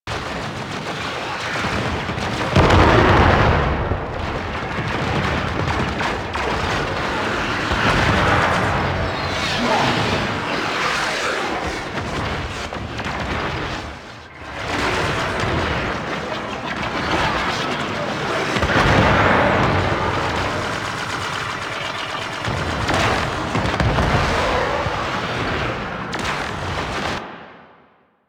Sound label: Space Battle Loop; Mission Two; Warframe; Quests; TheNewWar; Ambience; CapitalShip